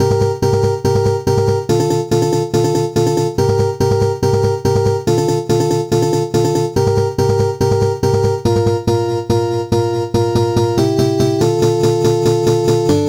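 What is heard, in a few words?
band acoustic guitar; BGM; infinitefusion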